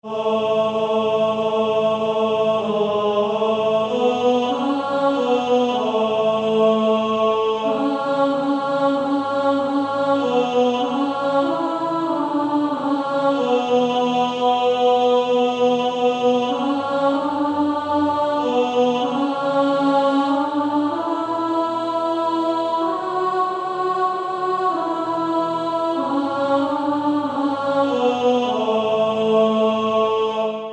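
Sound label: Male Choir, tenor 2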